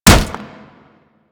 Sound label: Staff Impact Flesh